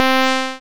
gbuzz sinfor

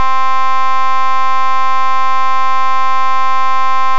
pulsos, vosim

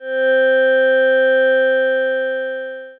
z sinusoide amortiguada

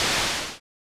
blanco confor lpc

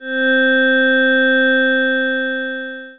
s sinusoide amortiguada

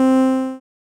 buzz confor lpc

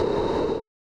blanco confor formantes

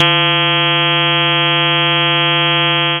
sinusoide, Vocoder; pvadd

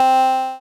buzz con formantes

